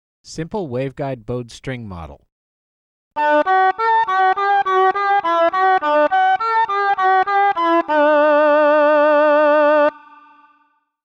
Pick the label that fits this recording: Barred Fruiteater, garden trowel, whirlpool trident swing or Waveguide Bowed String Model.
Waveguide Bowed String Model